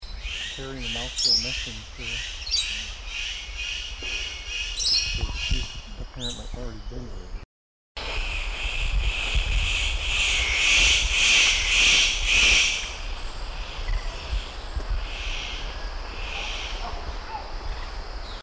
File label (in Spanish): Ave del paraíso de Lawes Parotia lawesii sonidos